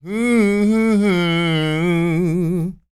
SING, Blues Soul; Spectrasonics; Vocal Planet

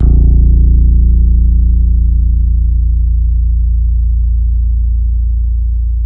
HOLLOW FRTLS, Bass; Spectrasonics; Bass Legends

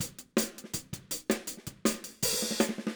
Fill Hi-Hat, Drums, 162 BPM; Drums from the Big Room The Mixes; Take Your Toms Off

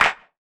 CLAPS HIP; Best Service Dance Mega Drums

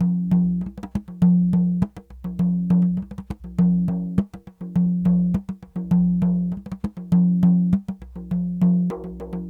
Sm Frame, World Percussion

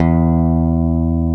LES PAUL

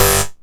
Synth Hit G

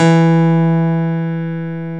PNO, Electrics, Grands; Club-50 - Foundations

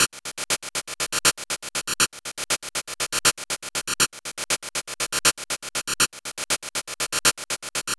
Anabeat Hat; Sonic Foundry (Sony Creative Software); Prototechno

